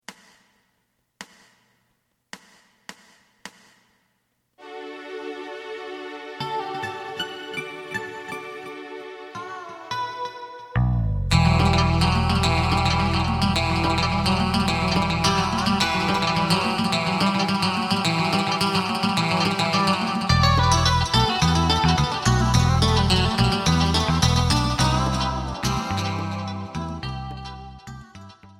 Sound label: drum